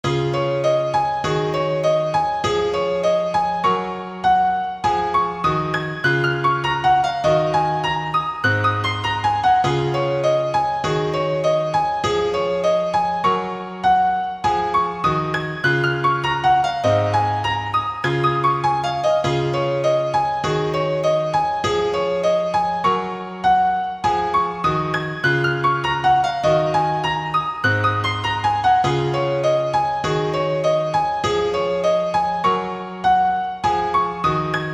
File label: piano